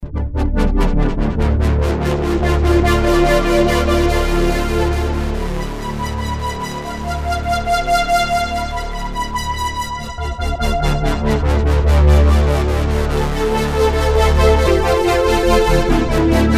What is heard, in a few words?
LFO, Korg DSS-1; filter cutoff; modulation for delay effect; main core based on 8 bits CPU Intel 8085 manages 8 voices polyphony